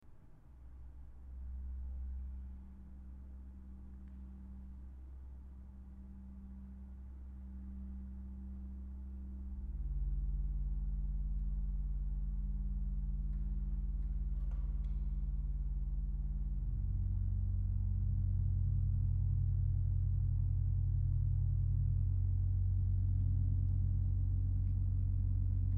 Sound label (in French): Orgue outretomba; Le retour d'Ulysse